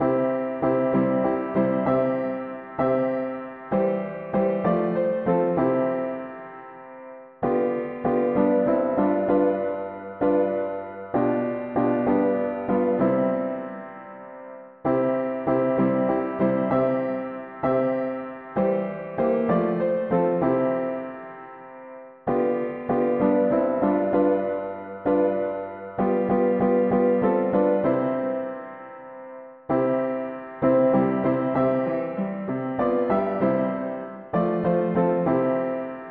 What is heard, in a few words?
mono piano; hymns